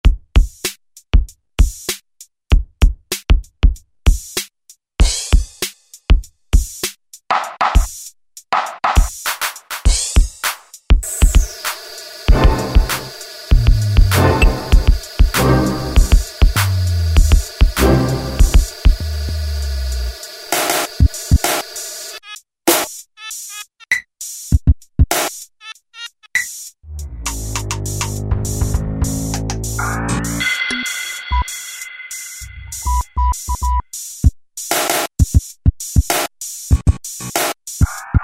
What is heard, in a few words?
Drum Set